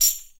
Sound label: TAM, NYC Percussionworks